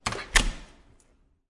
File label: door latch average size; doorSounds